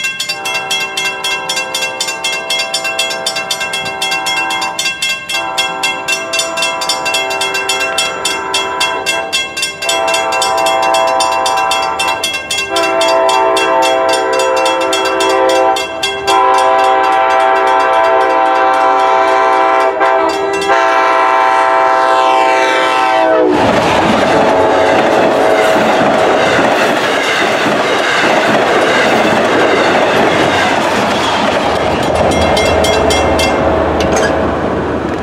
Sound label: flying through, K5LLA, dead 1L bell, horn; New Hyde Park February 2019; lirrhorns